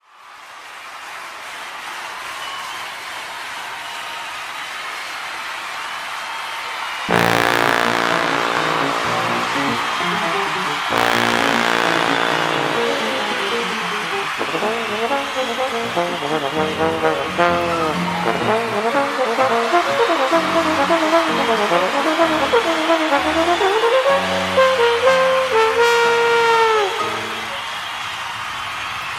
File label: Trombone Entrance